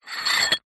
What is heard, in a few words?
household small ceramic sugar pot lid turn twist on base; zapsplat